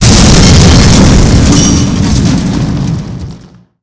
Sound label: light vehicle blowup